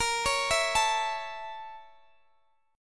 A sharp Minor Major 7th Flat 5th, down, Guitar, DADGAD